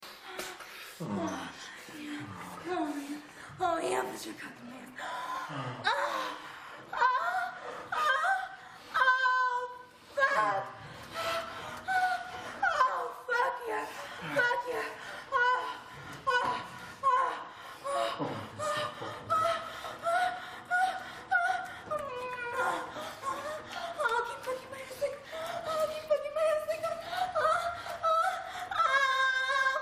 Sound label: moan